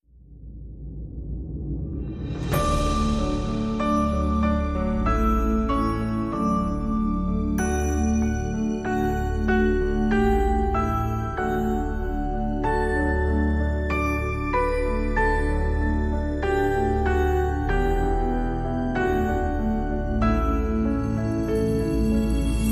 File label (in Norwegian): Deilig Er Jorden (Norwegian Christmas Song Based On Schesien Melody), Verse 3